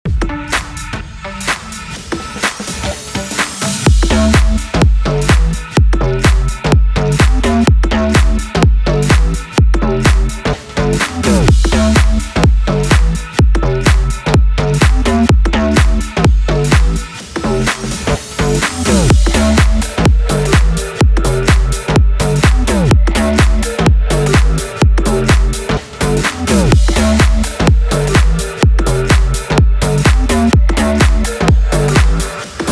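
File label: FUTURE HOUSE D minor 126BPM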